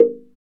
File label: PRC SLIT DR, Orchestral Blocks; Roland LCDP03 Orchestral Perc; Orch Toys